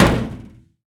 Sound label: bolt metal, material; STALKER; IWP